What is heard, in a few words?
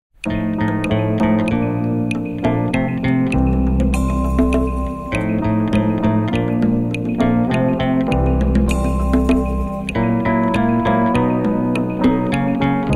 Quartet, Soul